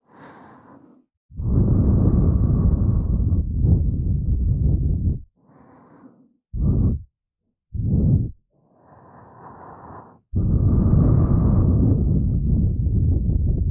左耳ふー5 分, ASMR

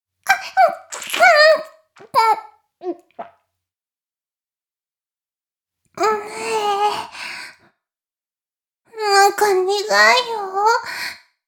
フェラ音声; おにいちゃんアイスぅー！; 妹

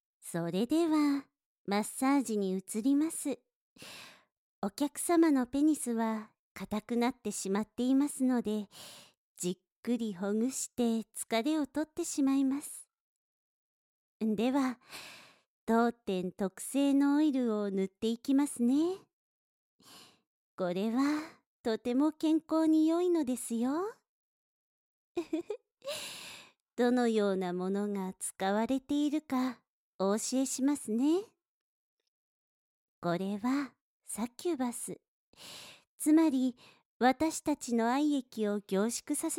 マッサージ, ASMR

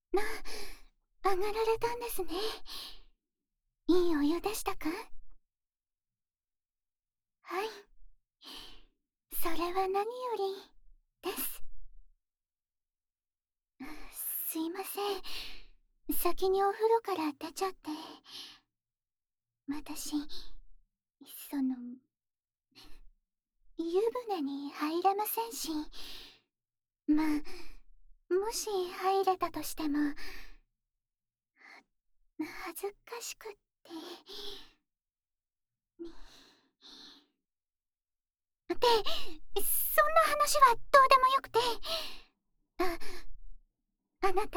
お耳…キレイにしますね…？ ～耳かき・耳吹きASMR～; flac(96kHz24bit)

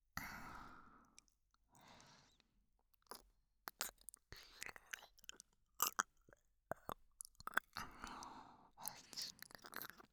耳舐め リピート 右耳; NTR; 右耳ver

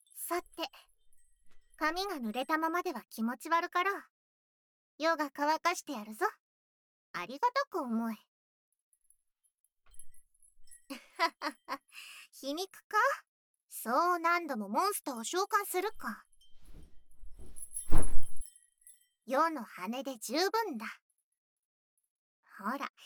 マッサージ, ASMR; 魔王のマッサージ

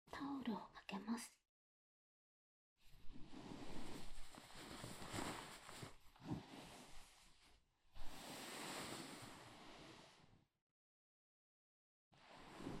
マッサージ 音; ほぼ音だけトラック

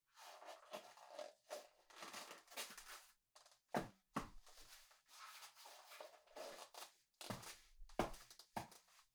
パンプスを履く; 3DIO; 色々な動作

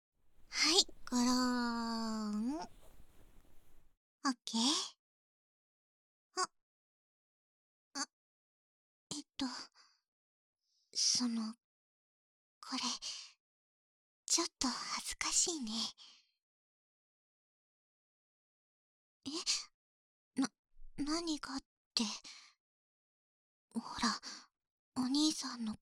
左の耳かき、耳舐め; ふふふっ、お兄さんの顔、どんどん可愛くなってきてる……♪

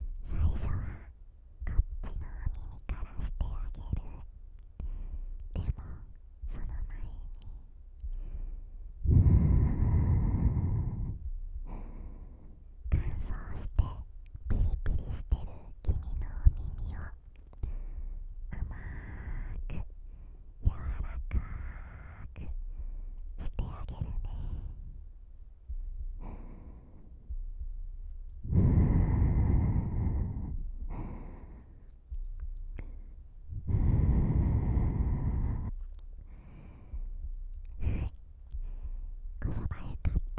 お姉ちゃんの甘い吐息（右耳）

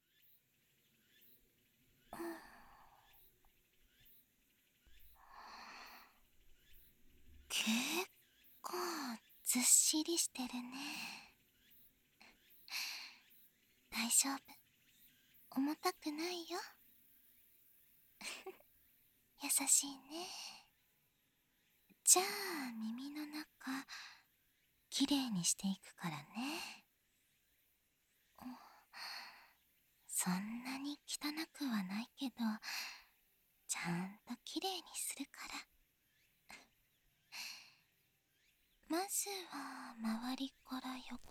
耳かき(癒・安眠), ASMR; 癒しトラック

